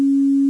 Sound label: Cis 135 p 128